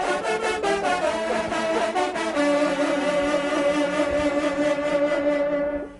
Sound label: horn; gcompris; instruments